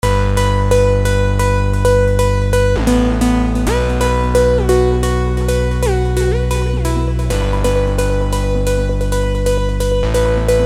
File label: LD Function; Vengeance Sound Avenger 2; Generative 1